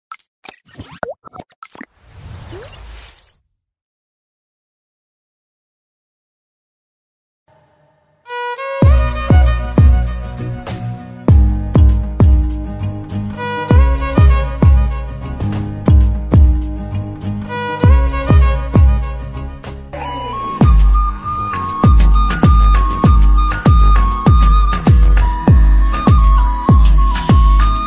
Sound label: bgm romantic ringtone, romantic music, background music